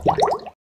consume potion; Adventures in Lestoria; action RPG game; Sig Productions

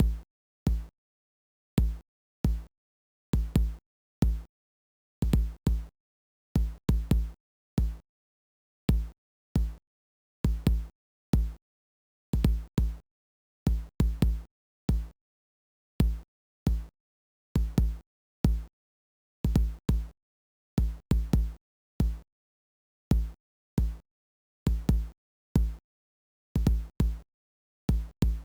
kick; Adventures in Lestoria; action RPG game; Sig Productions

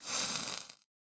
wizard auto; Adventures in Lestoria; action RPG game; Sig Productions

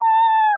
kitten mew; action RPG game; Adventures in Lestoria; Sig Productions

